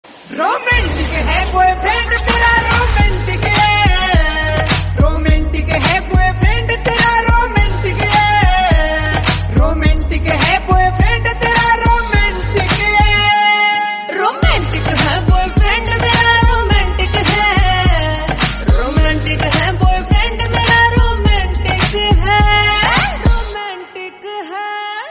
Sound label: Romantic, ringtone